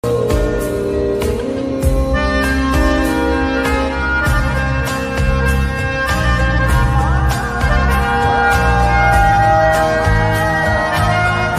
Shayari background music Copyright free New sad background music Flute Bansuri instrumental bgm